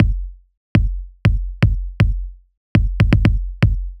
kick

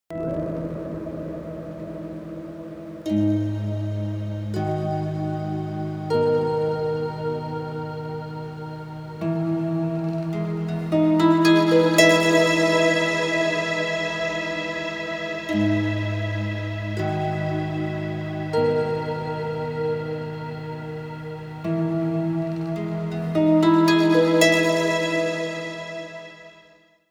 INPUT Reverb

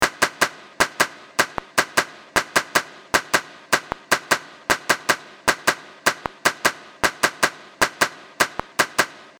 Clapping

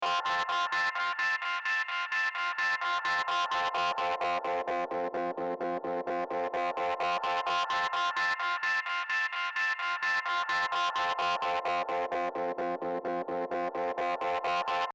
Organ

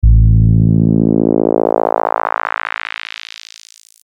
bp sweep

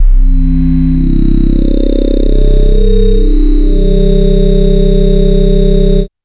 syn wave

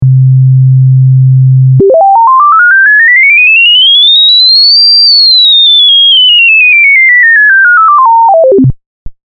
car

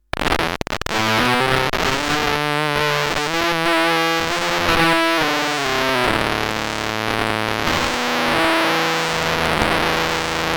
pulsemonger

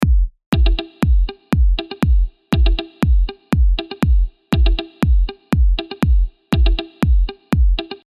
Gtr

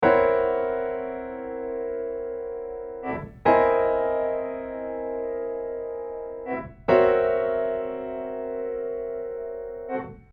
alt chords

